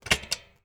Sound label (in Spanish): cambio marcha, YBR125